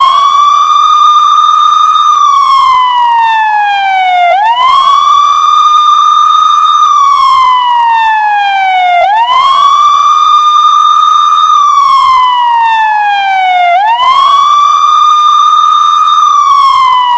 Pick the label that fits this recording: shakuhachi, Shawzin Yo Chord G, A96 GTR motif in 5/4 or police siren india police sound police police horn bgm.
police siren india police sound police police horn bgm